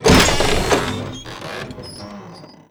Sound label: door wood creaky slow open; Doors; ModernWarfare